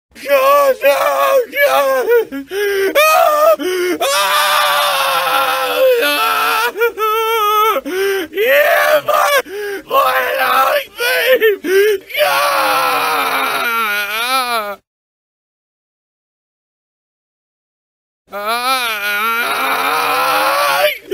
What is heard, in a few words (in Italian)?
effetti sonori urla horror terrificanti di morte e terrore